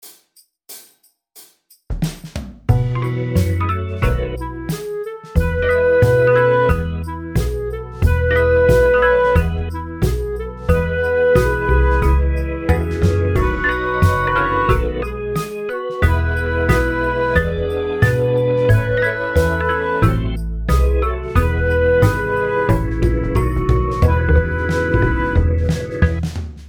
When The Saints Go Marching In Recorder

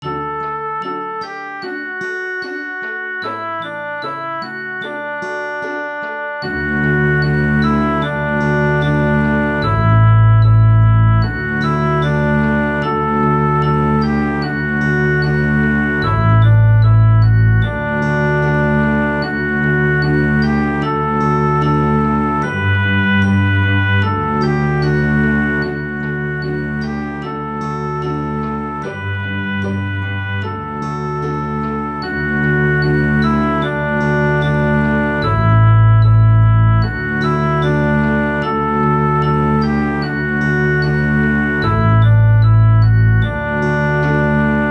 Lullaby bass

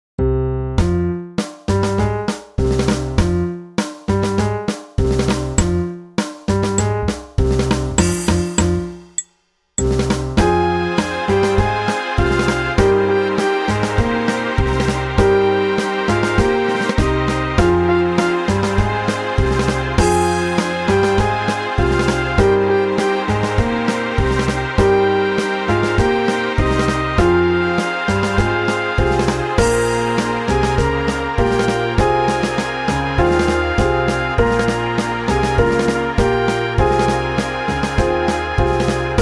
Tango Time E Flat

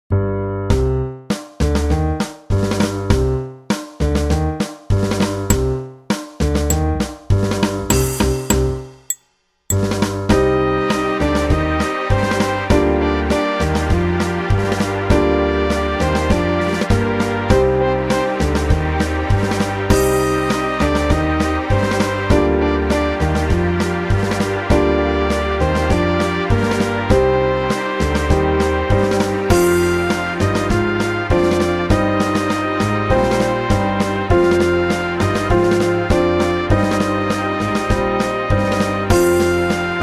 Tango Time B Flat

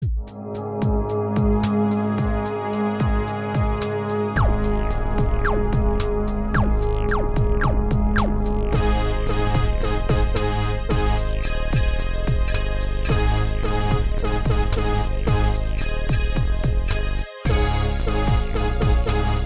Practice Tempo E Flat

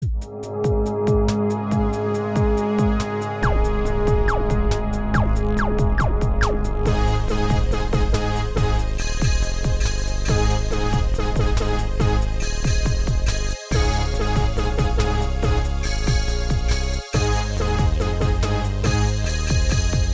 Flossys Flying Saucer E Flat

